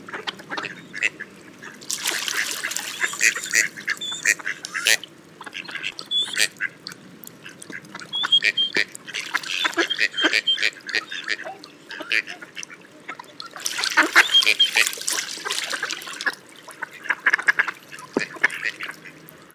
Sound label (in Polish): krakwa krzykliwa